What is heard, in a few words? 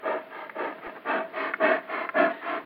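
deep rapid frequent breathing of a bear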